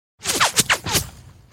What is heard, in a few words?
Bullets